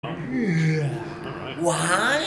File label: waaaai